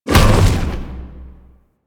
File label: Bowl Ball Impact; Warframe; Warframes; Grendel